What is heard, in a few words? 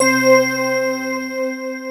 JD990